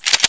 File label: pull lever, tesla weapons; nzp